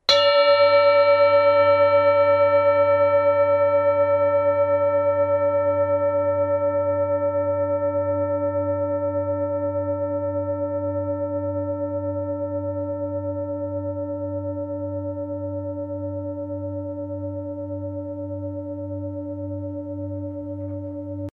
singing bowl

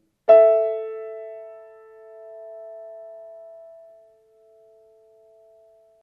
Kawai fifth A E

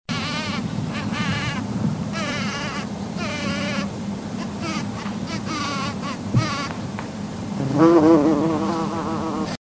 Buzz sonication

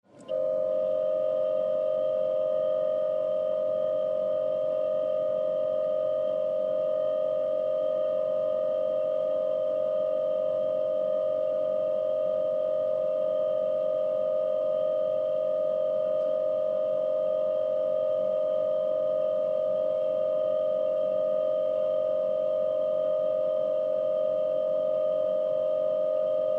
dither

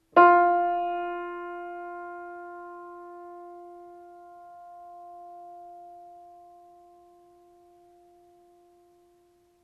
piano F

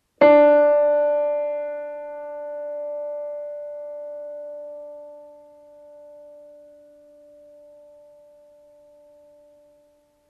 Kawai D

